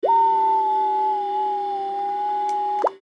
Meridian Scanner, Meridian Scanner Digital Readout Warp; Whistle; Hospital; Emergency